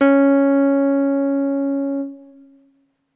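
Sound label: deep C#6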